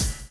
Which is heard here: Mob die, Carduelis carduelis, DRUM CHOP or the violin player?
DRUM CHOP